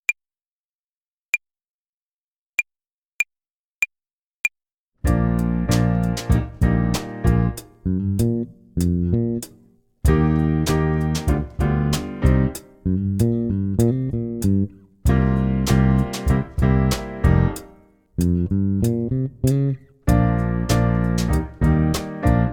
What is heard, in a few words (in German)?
tempo und rhythmus uebung hihat play along